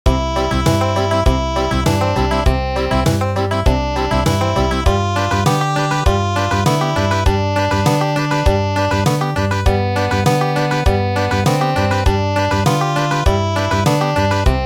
Country

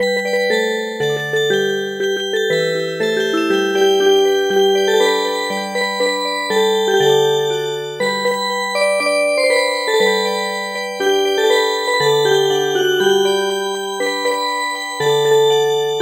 Music Box, midi

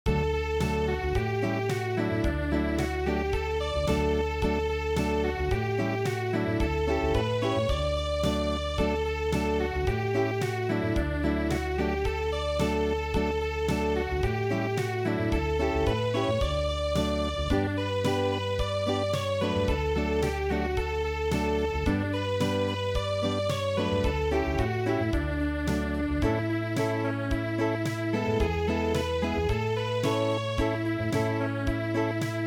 cheerful harvest Unplugged

